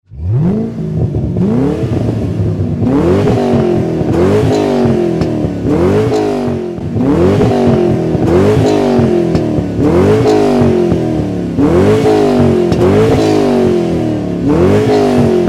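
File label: american thunder series, exhaust systems; flowmaster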